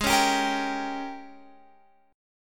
G sharp Major 7th Suspended 2nd Suspended 4th, Guitar, Open F; strummed; x,15,13,14,13,14; G#M7sus2sus4